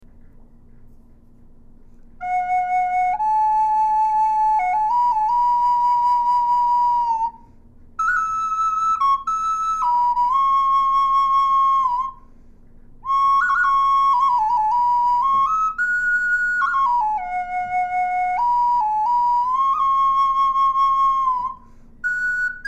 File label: High F Cedar, flutes